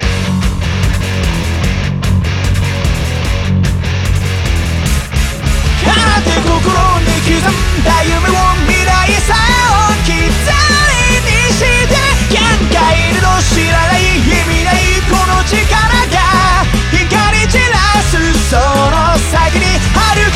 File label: Male Vocalists; Z-I-v Summer Contest 2013